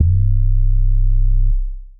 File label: Full Sub Pocket Bass, Synth Bass; Ableton; Factory Packs; Beat Tools